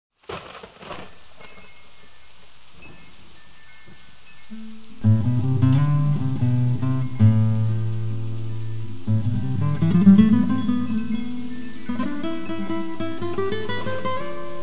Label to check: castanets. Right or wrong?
Wrong